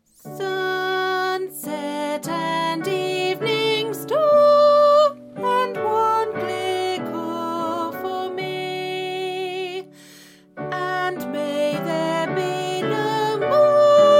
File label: Soprano; Crossing the Bar